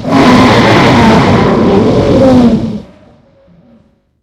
dragon roar; ragemap2024; grunt

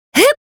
charge; ayaka

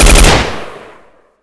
fire, weapons

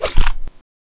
colt whip

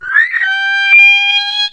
he attack, houndeye

